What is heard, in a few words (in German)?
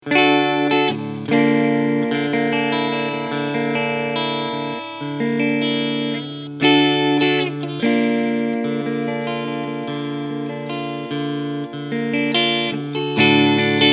Fender Vintera 50s Telecaster Cleansound beide PUs Akkorde